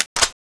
reload; crow3

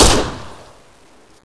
mg3; cso2

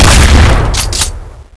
m3 dragon

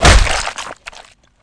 hit stab; zombie tank

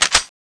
crow3 reload boltpull

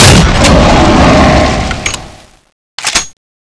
canon fireball; weapons; cstrike

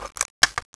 pin pull; weapons; cstrike

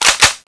draw; weapons

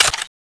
clip out; weapons

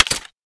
clip out, weapons

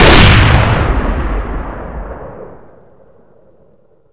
awp

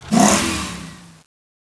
arx160 moto effect

